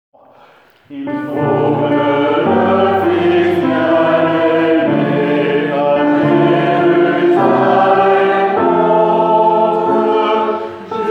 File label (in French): Il faut que le fils soprano